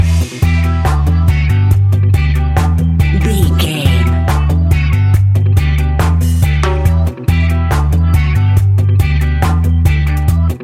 reggae ska fusion music, Classic reggae music with that skank bounce reggae feeling; Great for summer BBQs